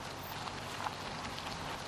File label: grass